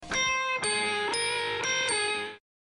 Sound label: SMS Tone